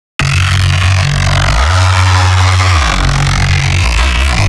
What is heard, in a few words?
NEURO BASS